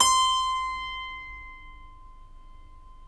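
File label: C5 clavinet right, Keyboards Acoustic; USB Soundscan vol.17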